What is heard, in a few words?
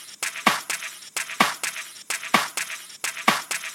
House Loop, 128 BPM; Vengeance Samples